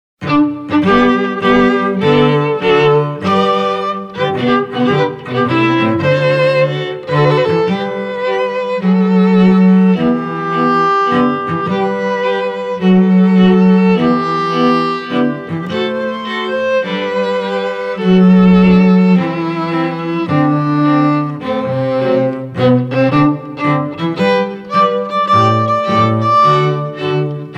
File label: String Trio